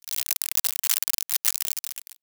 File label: applause